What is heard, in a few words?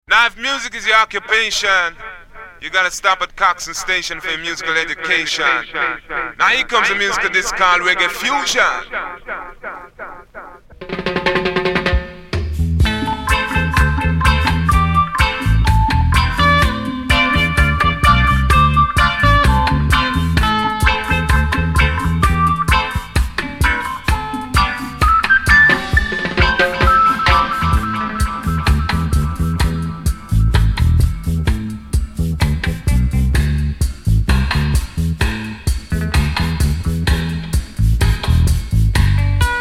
Reggae Fusion